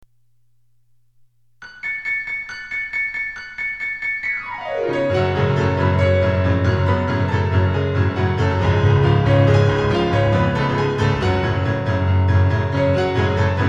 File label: Piano